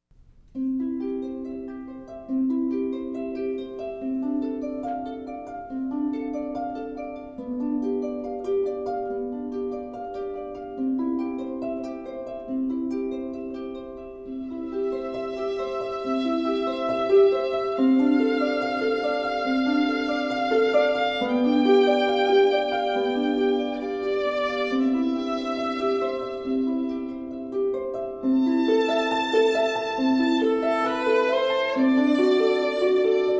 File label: Harp Violin